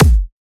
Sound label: dubstep